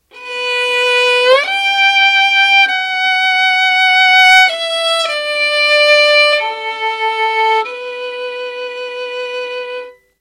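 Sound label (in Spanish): violines tristes violin triste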